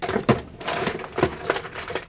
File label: searching; best service Blue Box; mixed sounds short